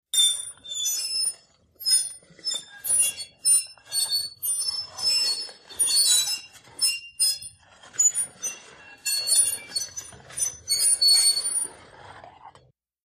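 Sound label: High Pitch Metal Screech, crashes & creaks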